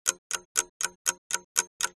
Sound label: clock ticking; kazan